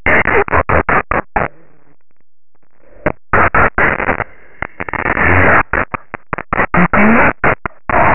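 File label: vocal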